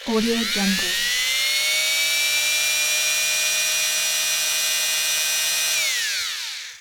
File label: robotic mechanism